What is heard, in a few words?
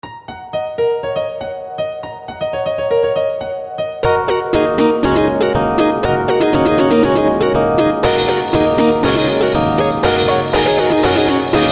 Piano Test